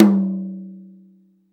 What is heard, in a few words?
TOM, Drum Dry; East Collexion; Drum 1